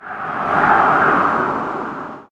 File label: ambience winds